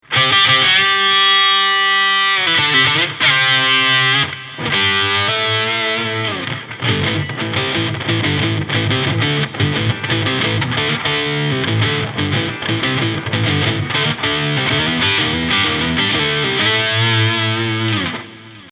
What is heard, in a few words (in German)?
5th verzerrt